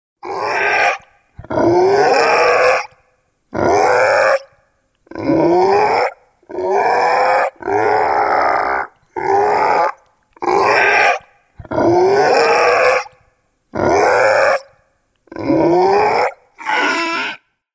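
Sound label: sounds of goblin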